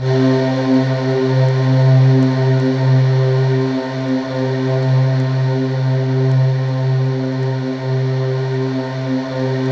C3, Synth Leads

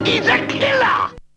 VOX; Zero G; Man Machine

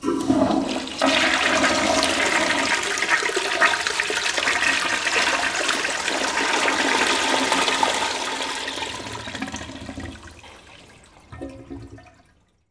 W.C. FRUSH, TOILET; AKAI S-Series CD-ROM Sound Library VOL-6

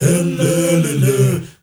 MALE HUM, M. HUMMERS; Spectrasonics; Heart of Africa

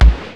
TALKING DR, Techno Trance; E-MU Formula 4000 Series Vol. 2

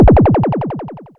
SPACE DRUM; Rubber Chicken Software Sample Bank; DRUMS + PERC

